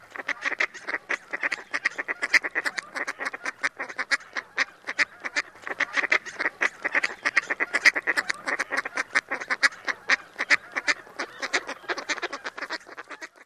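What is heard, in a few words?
MALLARD female cackling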